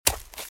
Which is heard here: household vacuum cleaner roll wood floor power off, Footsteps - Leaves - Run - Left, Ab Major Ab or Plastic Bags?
Footsteps - Leaves - Run - Left